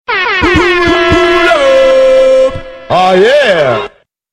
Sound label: Air Horn Oh Yeah